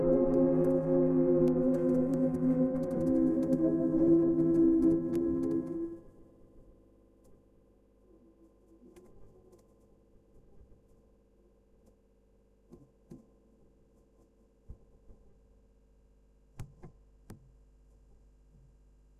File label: rain ambient; sound effects